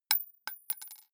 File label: gun bullet drop; krieg-waffen; geschoss